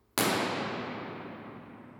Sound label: binaural IR; AgoraAutomated